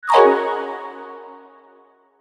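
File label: Hit Mallet Percussion, Hit Mallet Vocal Pad Delay Low; HITS; Blastwave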